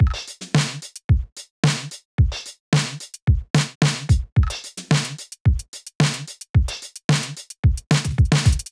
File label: Drums Loop only Drums